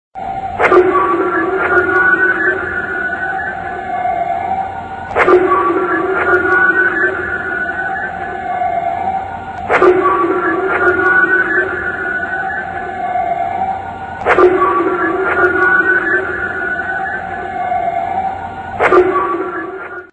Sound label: signal